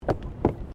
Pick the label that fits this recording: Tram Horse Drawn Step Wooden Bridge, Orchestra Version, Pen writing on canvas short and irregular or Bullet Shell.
Tram Horse Drawn Step Wooden Bridge